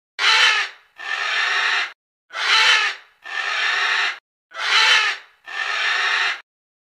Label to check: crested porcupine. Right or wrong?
Right